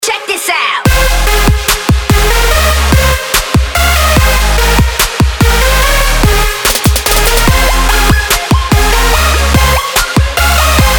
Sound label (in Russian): trep future bass; zhanr stirayuschiy granicy